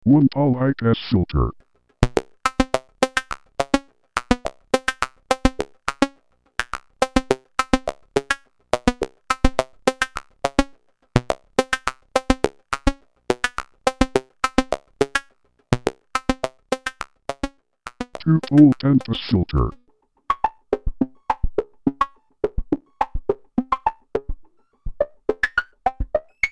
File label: Filter Types